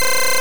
Scary Face

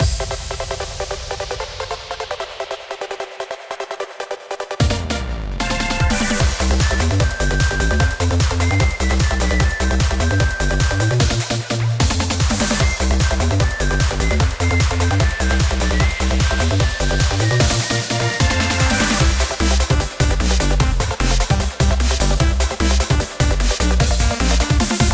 Control Playmap Rhythmical Bgm

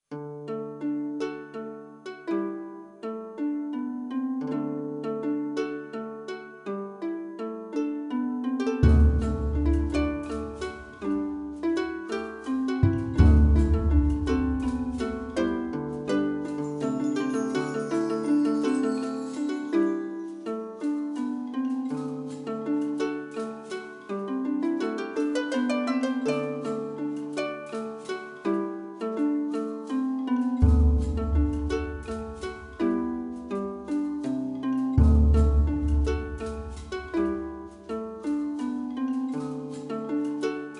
harps; dragon riders chronicles of pern 2001 dreamcast gamerip

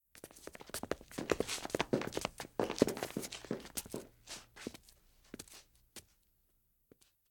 footsteps; Plant Tycoon

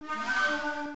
Boss BGM (Intro only); Mad Motor; arcade

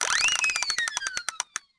Scrolling Prop Fast

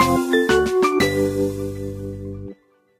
Pool Open; Pool Slide Story

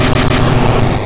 Egg Bomb Boom; sfx; attack moves; pokemon; gen 2; gsc

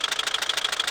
Extension Ladder Extend

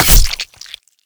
Attack Ironball

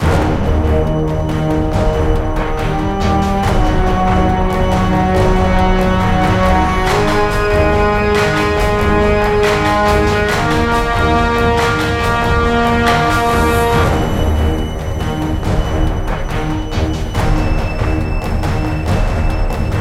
Combat Alt; Damnation

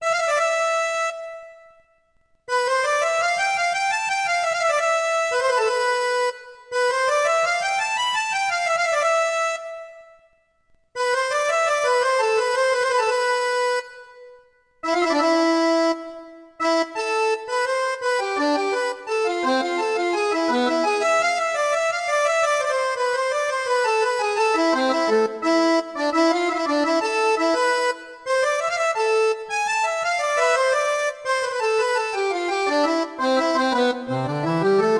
Town Tavern; Sid Meier's Pirates!

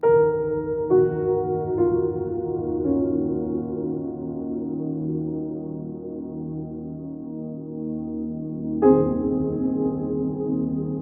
Conversation; soma gamerip 2015

